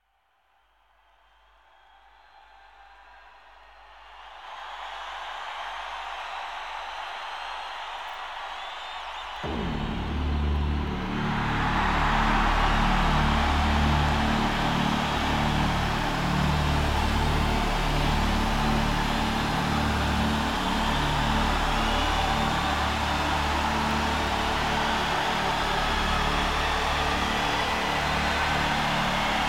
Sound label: Countdown instrumental